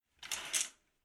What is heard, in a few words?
Household Drawing Curtains Wooden Rails Sliding Open; Slowly; Window; Tom Hutchings